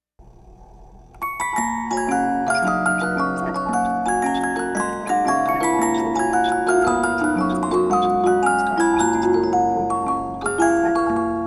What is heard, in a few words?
Music Box